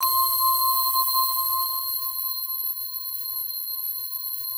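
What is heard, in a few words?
PAD, atmospheric pads; Best Service; XX Large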